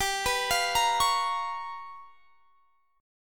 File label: G 7th Sharp 9th Flat 5th G; G7#9b5 arpeggiated